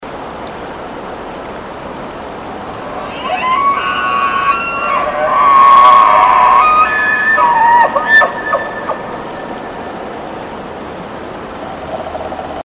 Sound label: herd bulls bugle response, elk